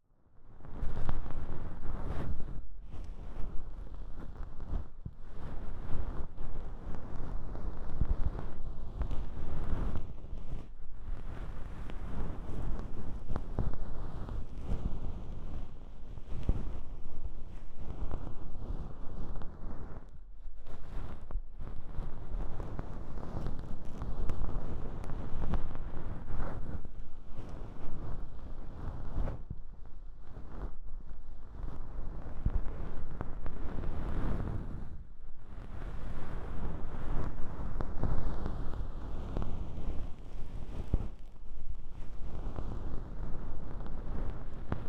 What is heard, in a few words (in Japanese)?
梵天で耳かき(両耳同時); スライム娘ちゃんの出張リラクゼーションサロン！?; 声なし